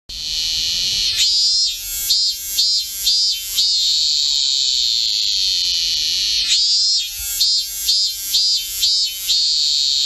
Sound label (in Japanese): minmin zemi, cry of insects